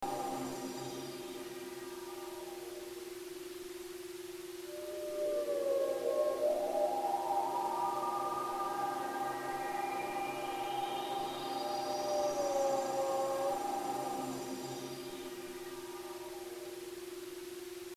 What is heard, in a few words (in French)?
synchronisation ondes cérébrales concentration; laser